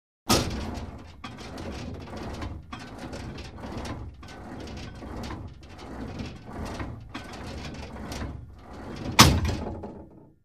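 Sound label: Gym Weight Equipment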